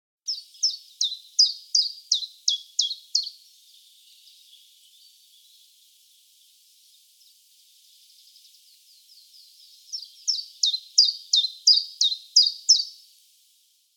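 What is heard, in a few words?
common chiffchaff voice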